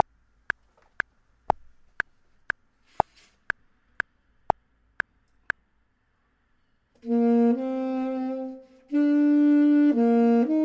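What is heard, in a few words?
bottom, Saxophone and Clarinet; voxman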